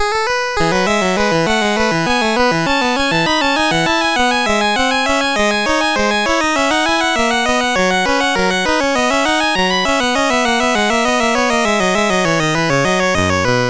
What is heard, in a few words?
Bourree, MOS6581R3; Joseph A Grau; Sixth French Suite